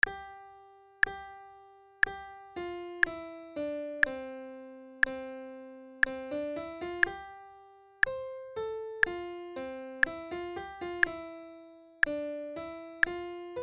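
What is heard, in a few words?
Rhythm four quarters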